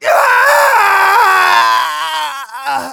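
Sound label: pain critical death, de; Scout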